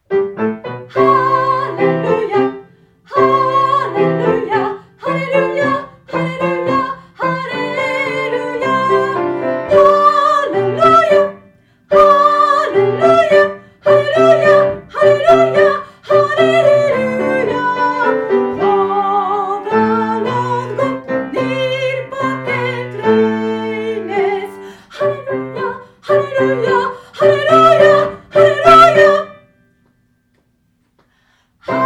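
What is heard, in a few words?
Hallelujah soprano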